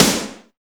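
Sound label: HYPE SNR, snare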